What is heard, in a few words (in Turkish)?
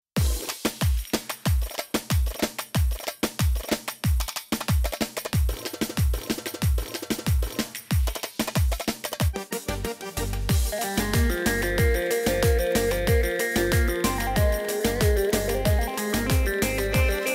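korg pa700 pa1000 pa4x oyun havası sample elektro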